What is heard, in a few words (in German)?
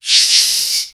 reptilien hisses und roar